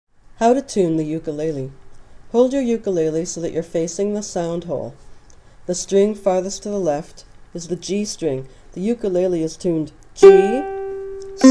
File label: ukulele tuner